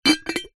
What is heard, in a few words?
household teapot ceramic lid remove; zapsplat